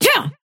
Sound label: effort melee small